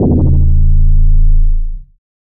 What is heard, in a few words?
Sub Spring; FREE SFX PACK VOL 1